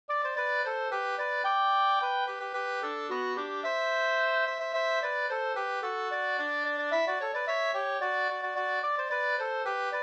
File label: polka polkett; e CH Erlandsson Up 2st